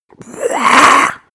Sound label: monster gasp aggressive; kreaturen